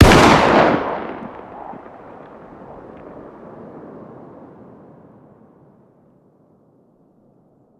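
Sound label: fire distant; gmod; arccw uc commons attachments; ub12ga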